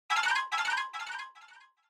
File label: Scene Change Short Delay